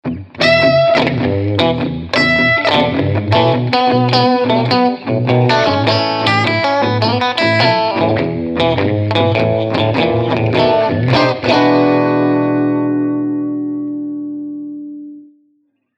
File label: large blackface combo, amp designer; logic pro